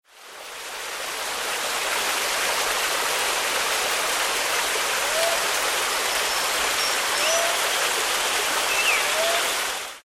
waterfall

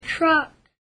truck

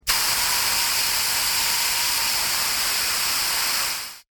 spraying

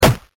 kick

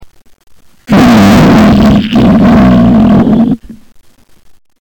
fart

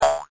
BOING

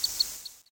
Calidris minuta

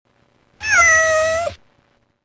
kitten

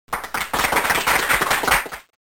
Applause

